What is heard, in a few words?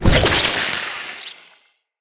infested fishing spear throw; Weapons